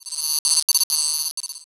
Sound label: Science Fiction Sci-Fi Electronic Scanning Probe